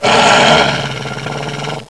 gonome death; svencoop

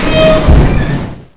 elevator catch; svencoop

